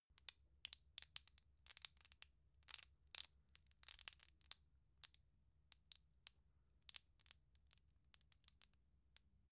plastic blister handle click

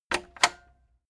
cassette eject click slow heavy

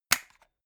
plastic remote battery compartment close heavy

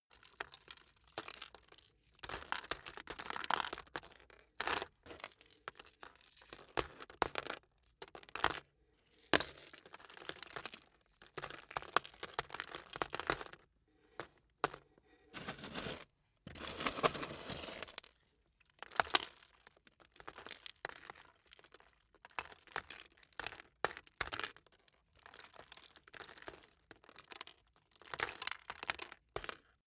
glass debris fall sequence long

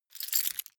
key ring large grab hard

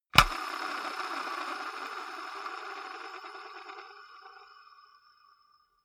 gaming mouse wheel scroll smooth

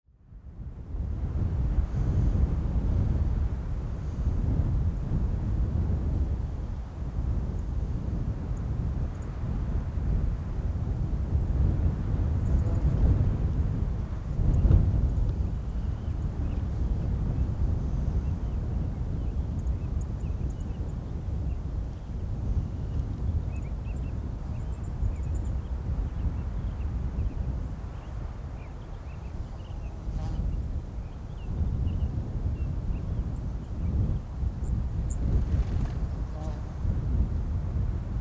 mountain top in the wind

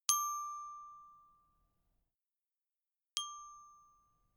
notification glockenspiel single low

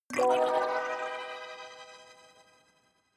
notification kalimba magical slide minor

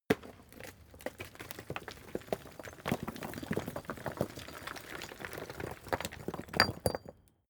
stone medium landslide short

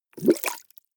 bubbles hard

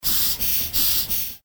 steam engine

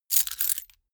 key ring large grab

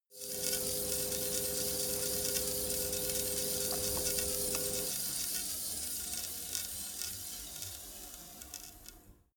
industry machine small wispy full sequence

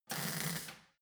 weld sizzle short soft

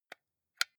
console controller xbox onoff press slow

